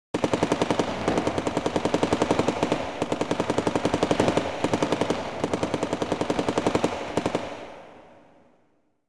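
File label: machine gun; ambient medvedkovo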